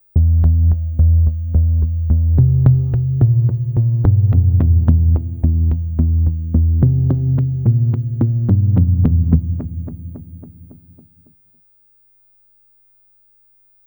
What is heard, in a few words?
Volca Keys Test Memory Man